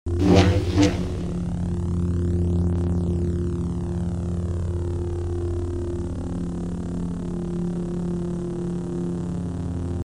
Saber; FXHome

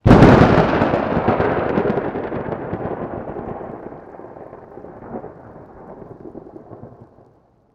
thunder mountainous big crack

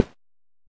footstep grass; Kenney's Sound Pack; Impact Sounds

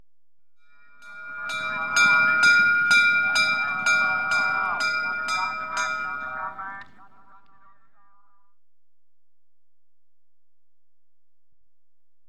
Polo, Bell For End Of Chucka, Equestrian Events; BBC Sound Effects Library

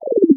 minimize, Interface Sounds; Kenney's Sound Pack

